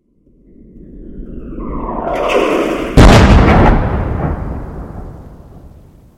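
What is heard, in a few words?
missile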